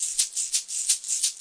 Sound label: 170 mini percussion, drum loop; 161 to 180 bpm